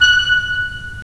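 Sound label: wind clarinet; Roland - LA Composer vol. 3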